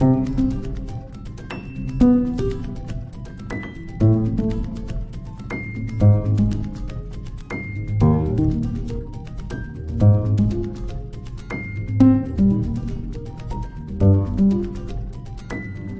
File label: 120 happy pianoish arpeggio light; KEYS ORGAN PIANO LOOPS